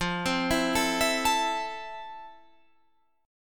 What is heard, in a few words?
F Major 7th, Guitar, Open F; arpeggiated